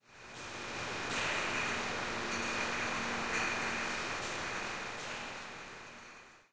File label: sewer; ruina